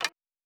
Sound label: Weapon Draw Wood; Fantasy Sounds Bundle; Medieval Combat Sounds